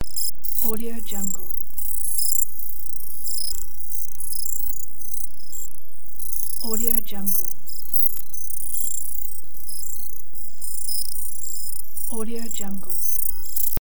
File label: glitchy data stream